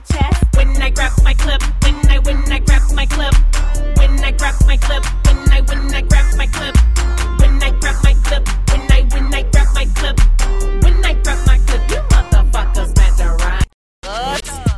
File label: gangster film samples